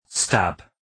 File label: stab